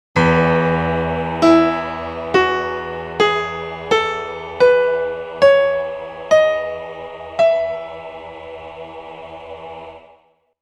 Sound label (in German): E Dur finden